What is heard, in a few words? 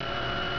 wheelchair jog; svencoop; decay